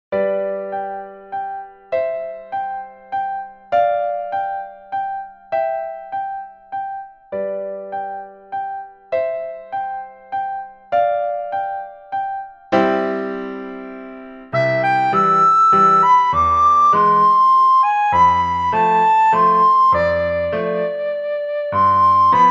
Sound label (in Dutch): sopraan; Flierefluiter 5; Super Mario Galaxy